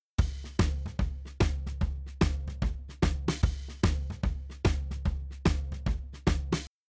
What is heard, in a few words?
Drums Shuffle SN FT CR; WDR2